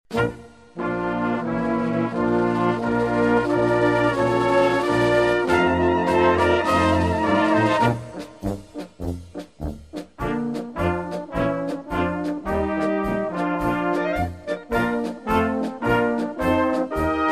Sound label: Polka